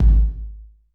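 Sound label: WET ROOM Kick 909; Masterbits - Soniq Elements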